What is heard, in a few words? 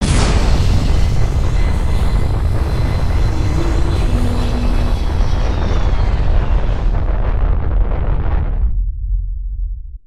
rocket landing engine seque